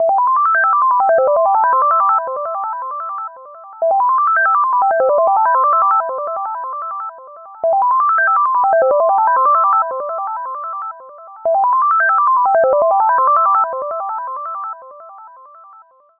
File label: Beep Dreamy, ringtone; sky ringtone